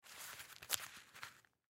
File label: Wallet Leather Open; Blastwave; Foley